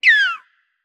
海豚水中待机声

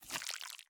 蜜脾上蜡声

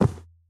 骆驼脚步声

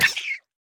美西螈死亡声

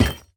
宝库放置声

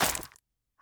根 破坏声

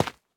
滴水石踩踏声

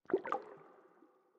水下细水滴声; ambient

